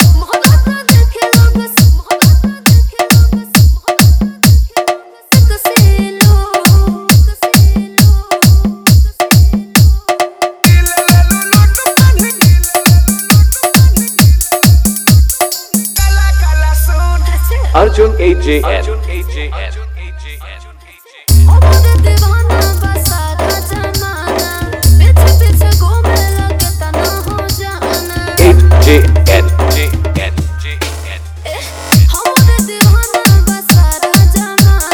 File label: Bhojpuri Viral Song Dj Hard Bass Mixx